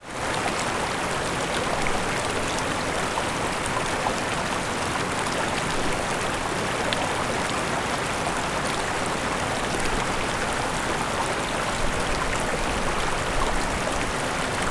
river and small waterfalls in distance pyrenees france